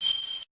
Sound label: confirm; mgs